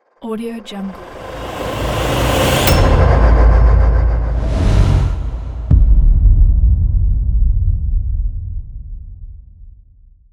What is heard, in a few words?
boom